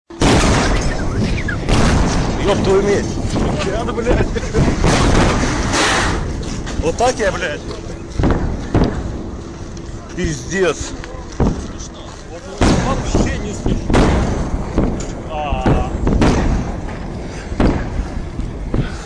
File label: Sonic Booms from Russian witness